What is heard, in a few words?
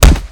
Physics Body Medium Impact Hard; Counter-Strike: Global Offensive